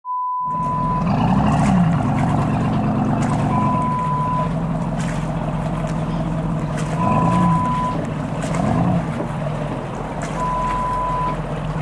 Marina Ambience sound

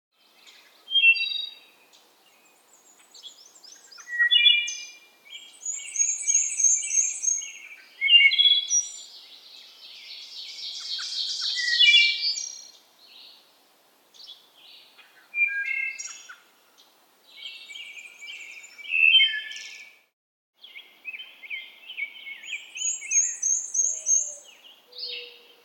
dawn birds combo

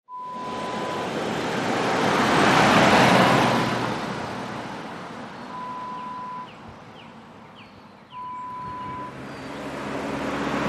Cars Country Road

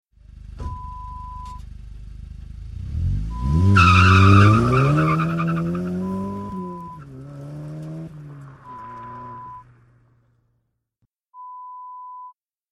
Car pull away

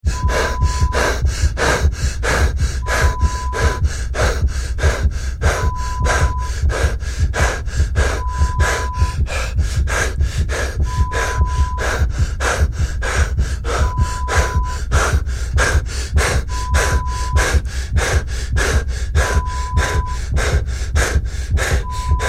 Body Terrror sound

